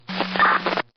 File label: Radio Noise